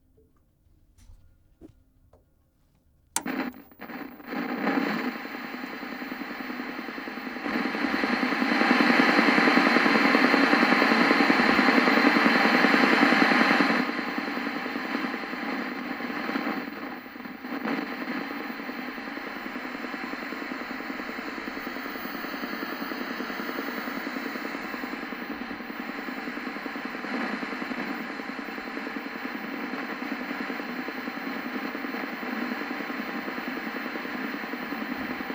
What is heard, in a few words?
Vintage Tv Static Noise